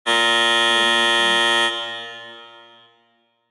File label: buzzer